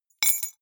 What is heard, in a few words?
key ring small drop stone soft